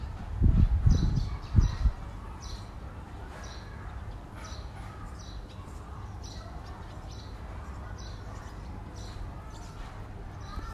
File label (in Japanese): ツバメ